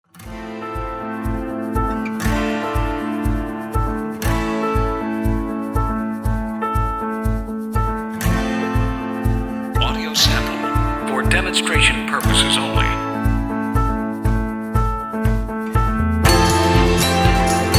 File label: LIGHT POP